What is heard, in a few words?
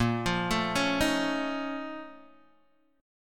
Bb Minor Major 11th Bb, Guitar, DADGAD; x,1,3,2,4,1